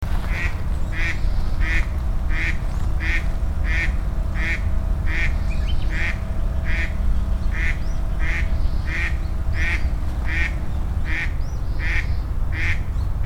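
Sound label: spot-billed duck; karugamo; hokubukouenn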